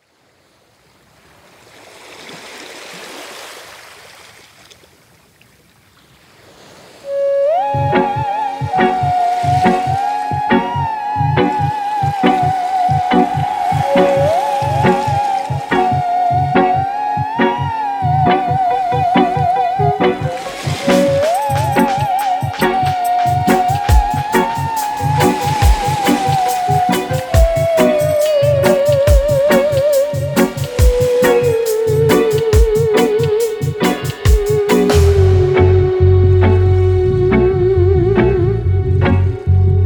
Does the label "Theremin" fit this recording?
Yes